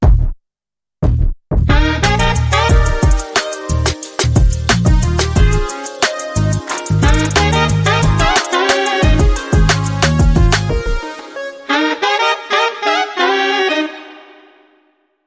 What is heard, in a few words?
Sassy Saxophone